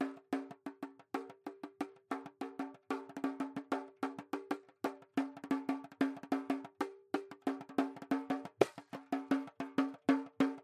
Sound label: Sea Drum; Nino 44